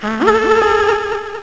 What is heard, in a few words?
Song; sc3; Syreen